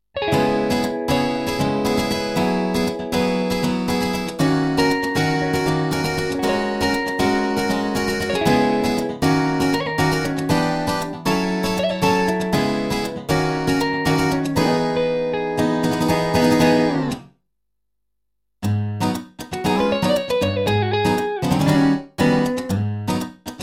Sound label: Jazz Gt Twin Rev; MidiGuitar